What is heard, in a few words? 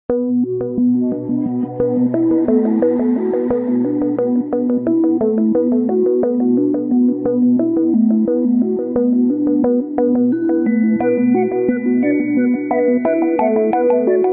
Quiet Ringtone